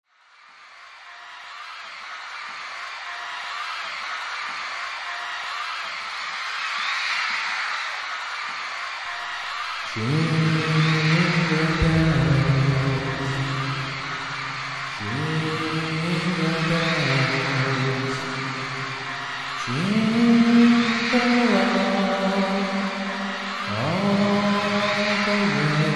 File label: jingle bells live; xmas